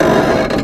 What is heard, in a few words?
Structure Crunch; The Avali's Audio Overhaul